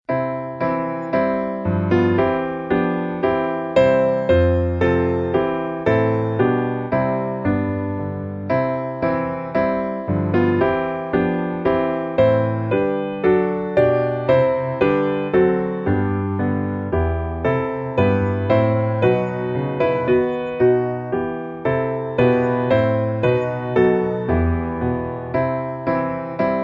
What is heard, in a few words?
piano